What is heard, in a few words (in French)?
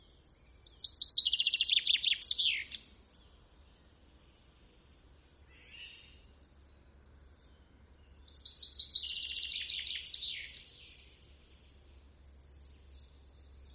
pinson des arbres; chant